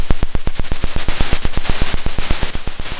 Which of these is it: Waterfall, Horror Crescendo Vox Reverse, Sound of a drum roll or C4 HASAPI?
Sound of a drum roll